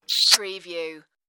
Kiss, Weird funny kissing sound.; kisses; human sounds

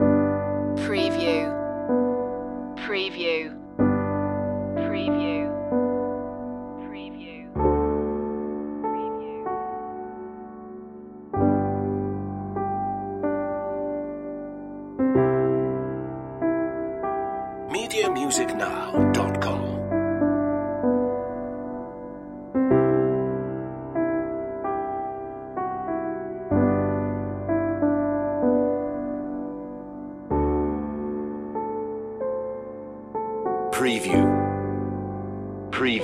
Silent Night, on-hold music

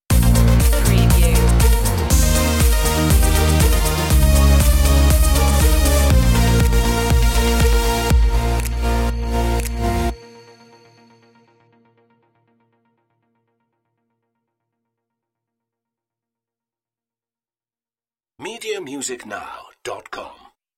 stinger, Electronica Electropop

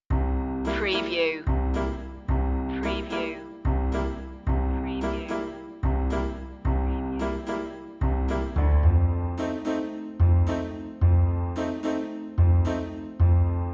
light walk no percussion

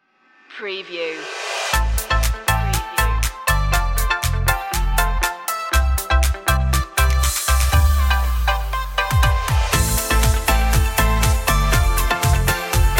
summer beach, pop, electropop

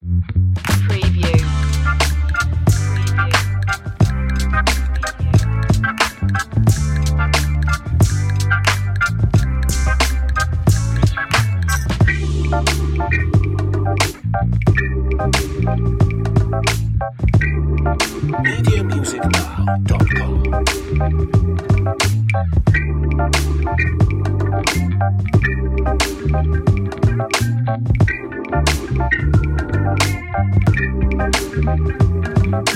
pretty cool funky, chill hop

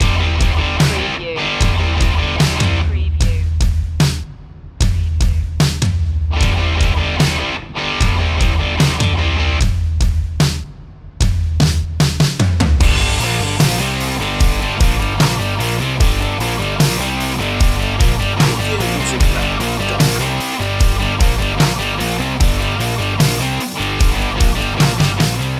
rock and roll riff song loop, Underscore version; heavy

